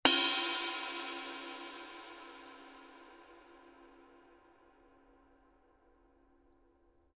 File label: 22 thin bell, paiste; masters